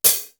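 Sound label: electronic hat, cymbal; East West; Global Sounds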